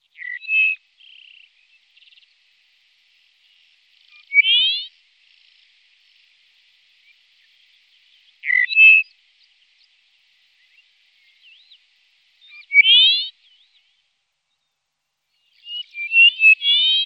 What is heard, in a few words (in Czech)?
skřivan dupontův, nasiptaci